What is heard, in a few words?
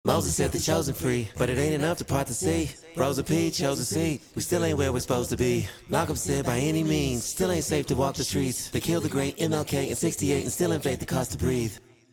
acapella example after vocal bender; waves